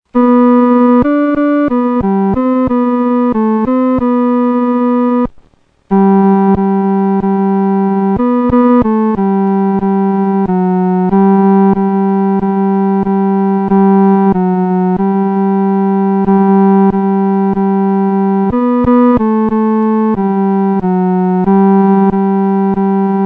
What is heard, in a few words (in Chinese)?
独奏 第三声